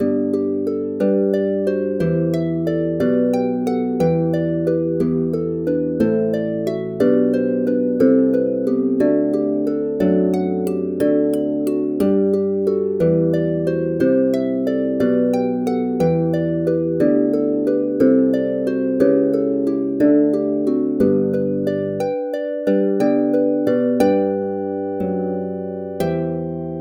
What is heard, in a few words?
harp